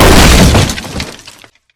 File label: shelter door break; Original TK Sounds